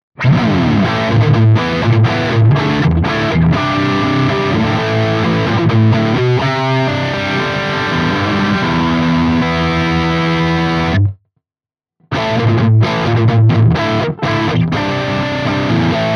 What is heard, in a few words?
rhythm riff all positions